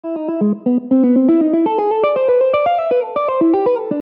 approach

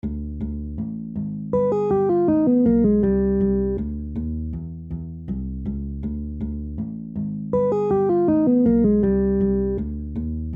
major 2 5 1

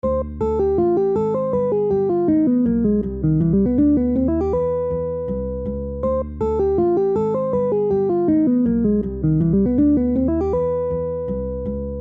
major 2 5 1

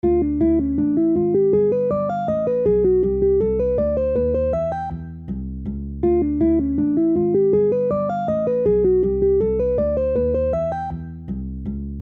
major 251

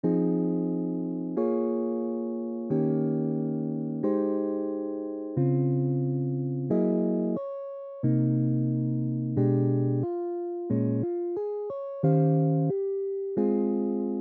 solo guitar

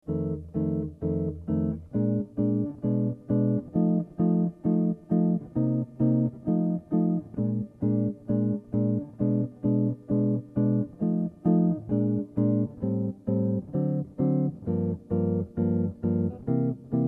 blues

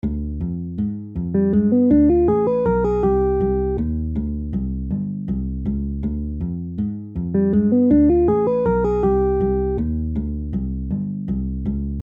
minor 251

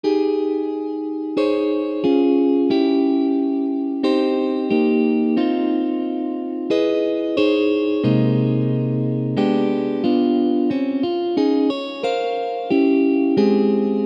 small chord

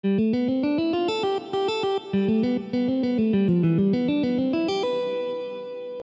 C Major Phrase

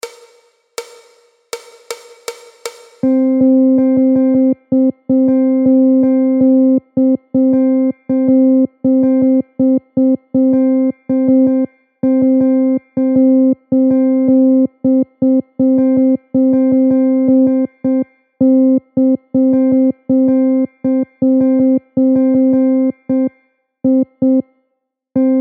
8th rhythm

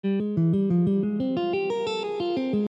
minor 2 5 1

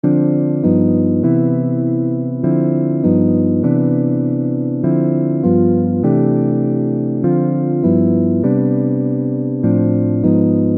Two Five Chord major

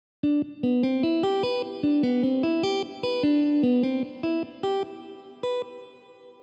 C Major Phrase

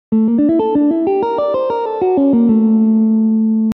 Arpeggio

